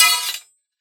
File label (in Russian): stolknovenie dvuh mechej v boyu